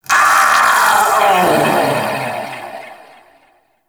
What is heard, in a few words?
Vocal Death; Warframe; Duviri; Dax